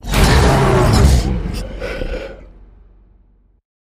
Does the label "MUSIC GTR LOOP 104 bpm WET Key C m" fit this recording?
No